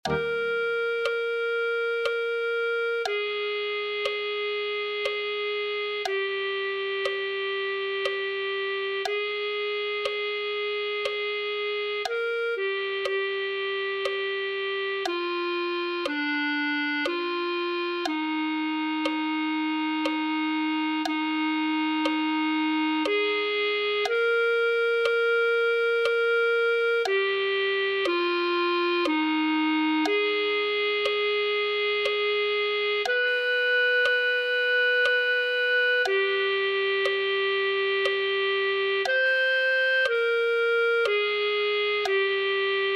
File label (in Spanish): clarinete